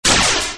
Drip die Snd; Robot Wants Kitty

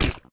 Meat Impact

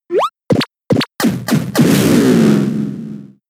Taunt; Pokemon; gen 6; XY; ORAS

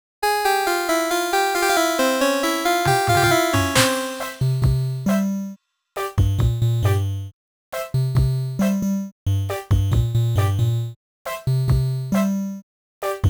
forester 8bit ver.

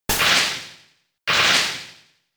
Thunder; Thunder Wave

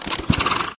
Propellerhat Desert Collect; doodle jump 2; android

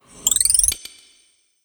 amendment progress

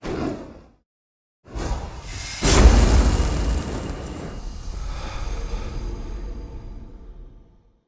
stab; yami killAragami

